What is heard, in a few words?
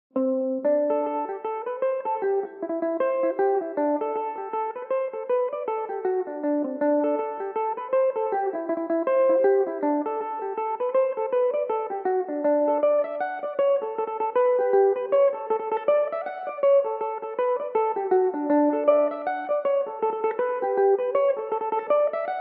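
reel D mixolydian, irish mandolin; road to lisdoonvarna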